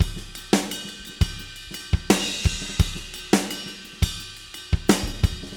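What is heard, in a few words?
86 bpm, beat loops; Zero-G; Monster Beats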